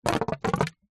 blow air into tube thin water gurgle dribble short burst; foley